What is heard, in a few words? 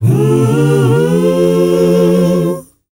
mm choir, S. African world voices